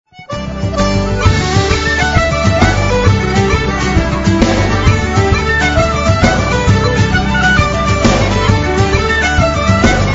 Jig; Dublin Castle

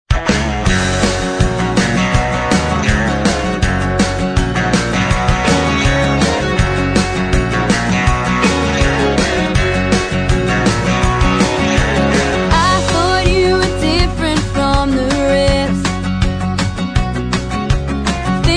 country music

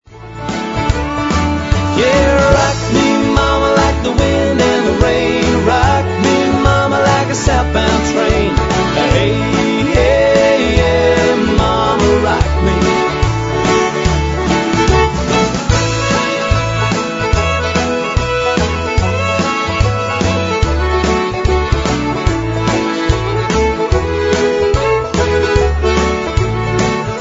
Rock

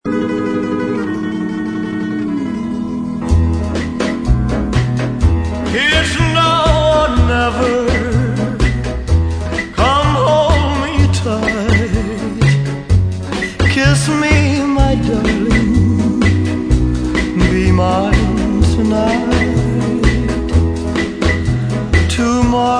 Rumba

